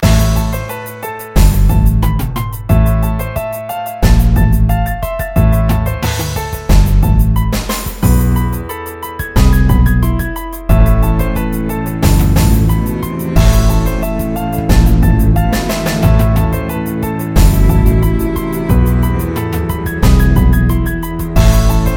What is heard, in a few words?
90bpm song